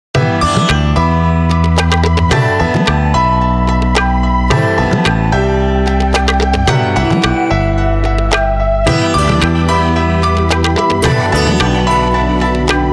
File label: India Retro, Nokia Tones